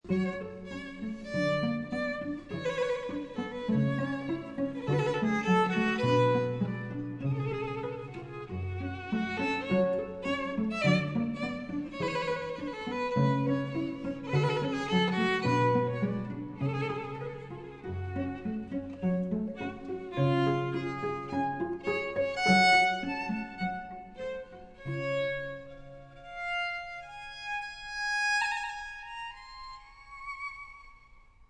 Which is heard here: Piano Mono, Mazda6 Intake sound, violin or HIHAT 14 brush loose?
violin